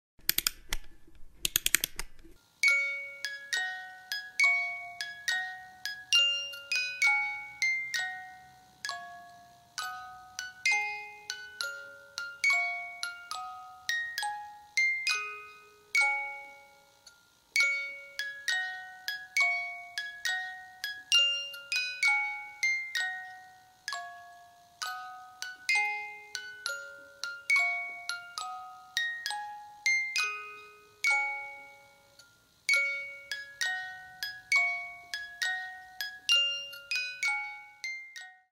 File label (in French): boite a musique bois; memoiresdoceans; enfants; poissons profondeurs